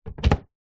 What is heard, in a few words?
bin small plastic flip lid open; household; zapsplat